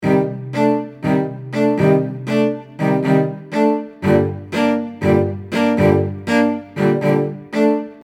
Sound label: Romantic Cello loopable jingle